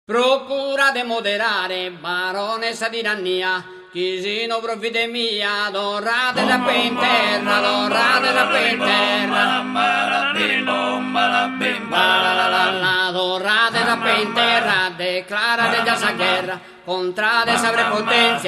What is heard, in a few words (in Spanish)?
TENORES; barones